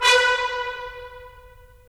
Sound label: BRS, Roland - LA Composer; Roland LA Composer vol. 4